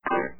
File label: squish Sound; trogdor canvas